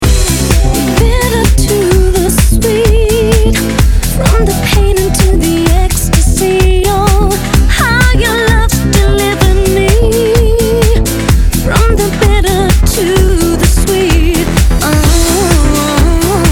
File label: remix